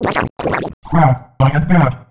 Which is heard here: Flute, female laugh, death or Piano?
death